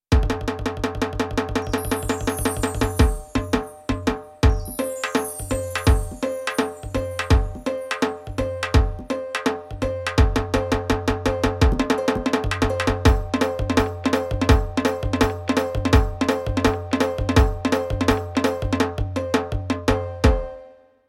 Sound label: Dhol